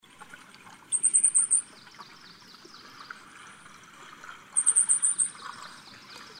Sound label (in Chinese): 火冠戴菊鳥; 台灣戴菊; 戴菊科/留鳥